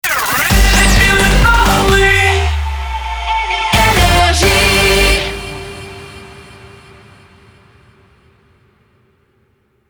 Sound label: Jingle Shotgun; NRJ